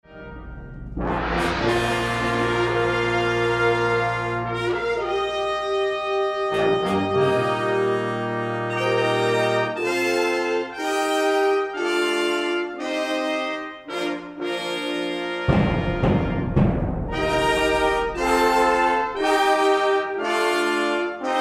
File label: Fanfare for the common man